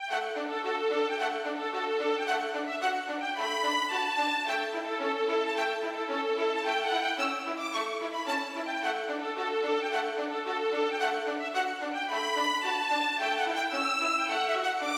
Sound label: Gallopade; Ursin